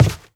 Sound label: scuff, footsteps cardboard